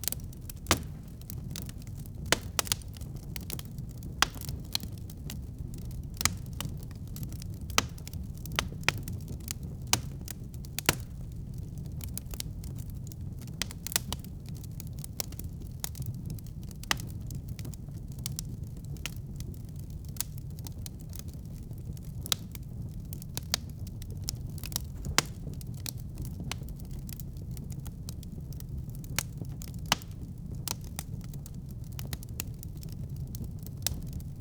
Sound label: muffled rain pouring outs; ambience